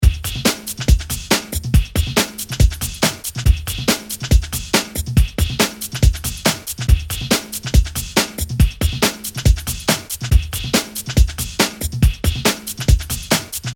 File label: loop clean; compression